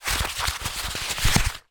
toy mask halloween thin movement; zapsplat

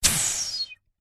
foley air puncture air release hiss whistle; zapsplat

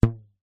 elastic ribbon twang; foley

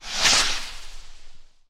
firework rocket launch

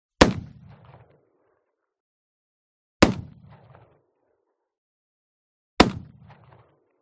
Revolver Shot 44Cal Remington 5m Rear; WEAPONS; SmartSound

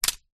household hand fan down on surface

